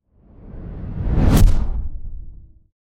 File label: Cinematic Woosh